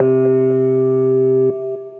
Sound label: Dub Brigade Piano; Piano & Keys